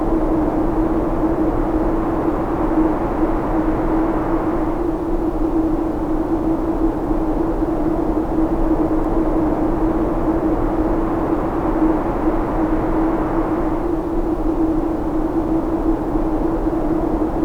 Terrace Wind

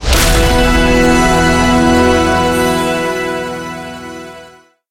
levelup window show

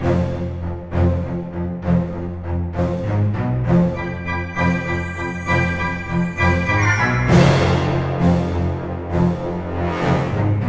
enemyphase; bandit; Symphony of War The Nephilim Saga

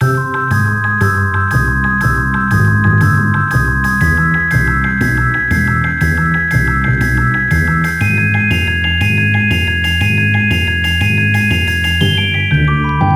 reveal music, Magical Forest; Spanish

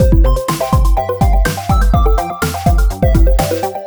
Electro Attack

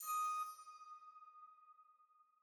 Alchemy Flute Combo; Alchemic Cutie; PS4; Xbox Series X|S

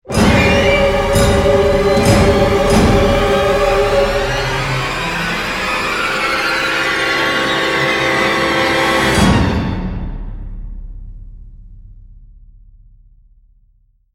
Mx Security Stinger

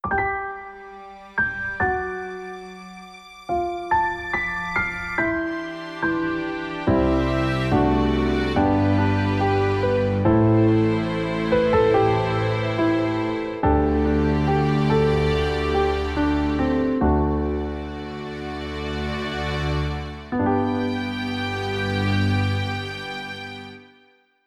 BGM Karaoke Ballad; tokimeki memorial girls side 4th heart; Scenario

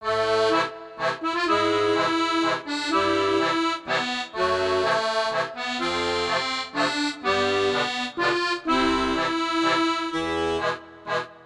Searching for Clues; Professor Layton and the Unwound Future HD; Android

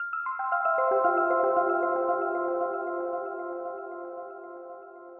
Puzzle Complete; Hexcells

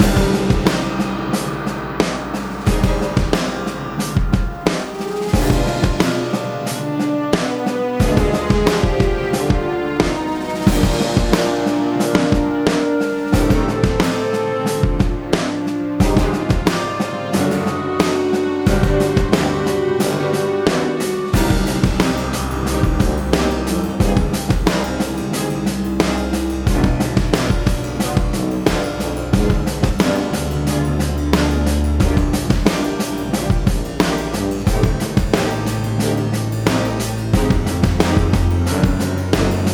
music boss; gargamel

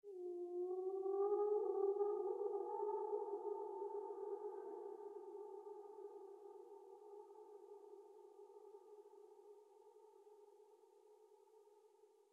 Jingles STING; Semblance

